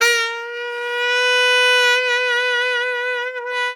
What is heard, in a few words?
sax; Winds